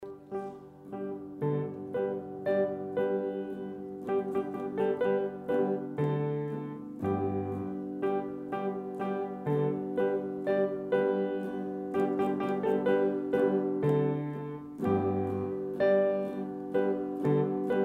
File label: Instrumental